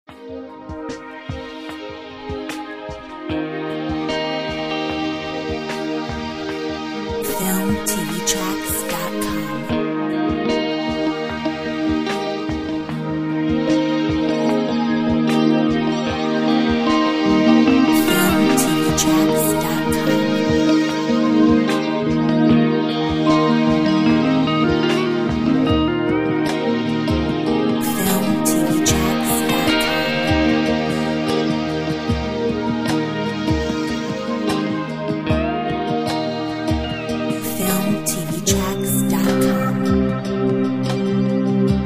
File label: Air Guitar; Filmtv-tracks